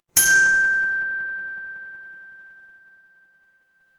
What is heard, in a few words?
instrument chime bar